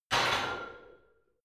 barbell heavy plates replace rack reverby hard

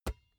basketball catch finger tips hard

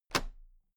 toolbox latches open heavy; industrie wirtschaft; handwerk

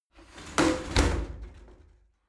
shower slide door close heavy

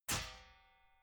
metal sheet crash; metall

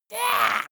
monster assault high; schreie

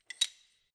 wrench open soft; schrauben drehen hydraulik

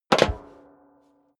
step leather shoes metal rattle jump; schritte

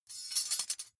midsize metal thin clank catch coarse

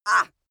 female attack

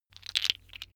tiles wood rattle; objekte; holz

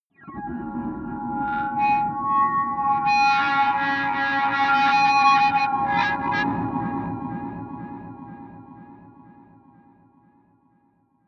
atmospheric feedback distortion short, drones; musikelemente

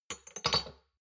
shot glasses stack soft; berufe; gastronomie; soundpool

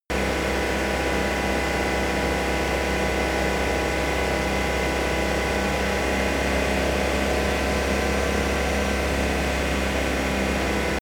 car engine steady 4000 rpm

